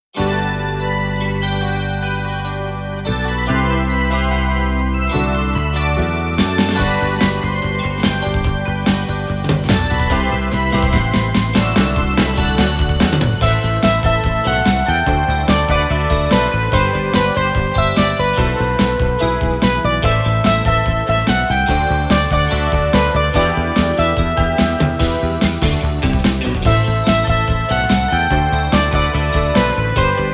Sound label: Angelic Wind -INST-